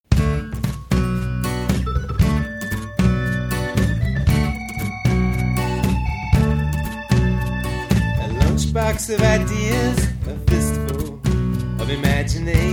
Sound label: Waltz